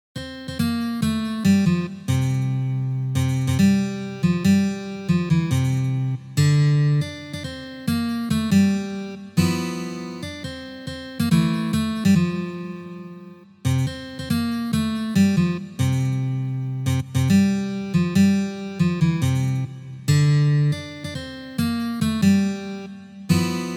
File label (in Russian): na gitare